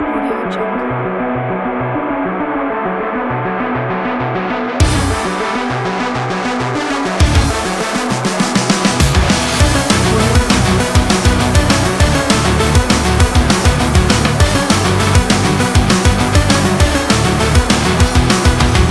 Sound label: anime race pack